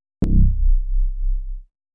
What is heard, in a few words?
growl bass